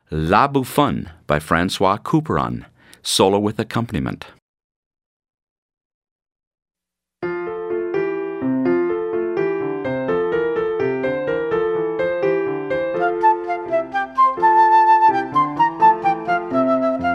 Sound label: Flute and Accompaniment; Grade 11 Solos